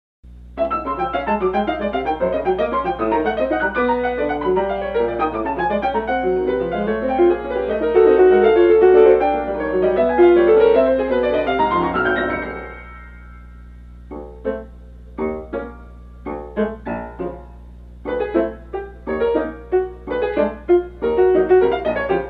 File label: Piano Prelude